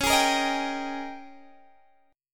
Ab Major 7th Suspended 2nd Suspended 4th Db, Guitar